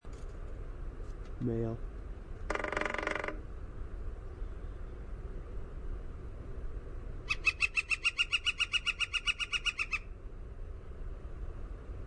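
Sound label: Northern Flicker drumming long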